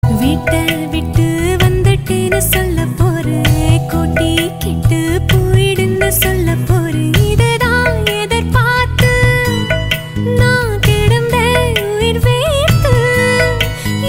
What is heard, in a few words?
Tamil Cut Song